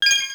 spinner bonus; osu!; Axarious x RafisADHD

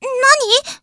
menu back; osu!; Night-Gre3n

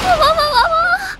section fail; osu!; Axarious x RafisADHD

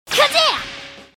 combo burst; osu!; Retronix 2.0

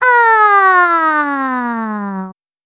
failsound; osu!; Night-Gre3n

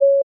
click short confirm; osu!; Luminance - Fluorescence

